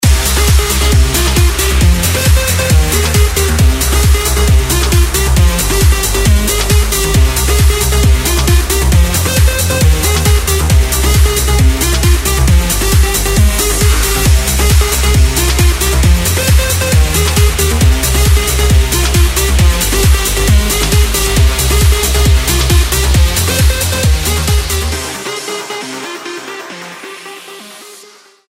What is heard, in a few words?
electro